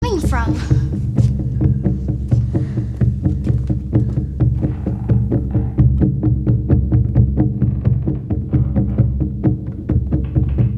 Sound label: drums beating